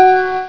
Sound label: TELEPORT